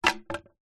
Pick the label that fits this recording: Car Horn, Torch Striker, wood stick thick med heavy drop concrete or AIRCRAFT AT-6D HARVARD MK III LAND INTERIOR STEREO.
wood stick thick med heavy drop concrete